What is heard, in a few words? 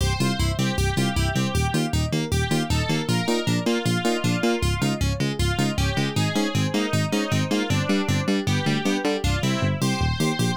Unused BGM